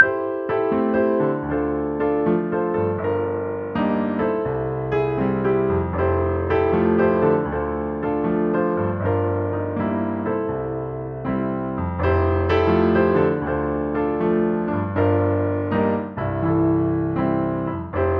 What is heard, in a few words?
Jazz Pianos; Fusionpax